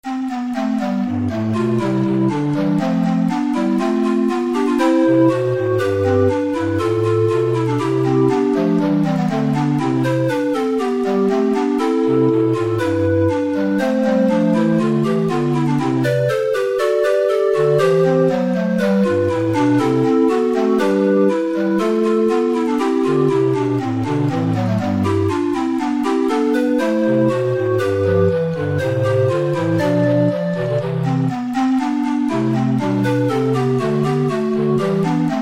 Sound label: organ